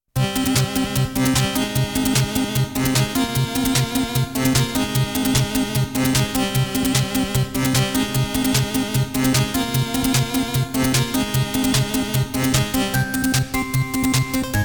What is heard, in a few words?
BGM